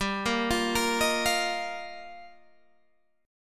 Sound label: G Minor 7th Sharp 5th, Guitar, Open G